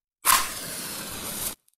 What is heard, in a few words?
match strike